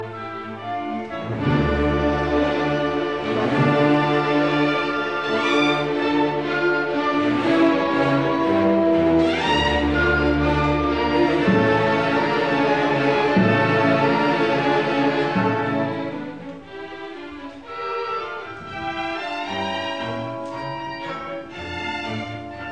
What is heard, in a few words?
Piano Concerto No 20 in D minor Allegro; Mozart